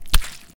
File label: Land Soft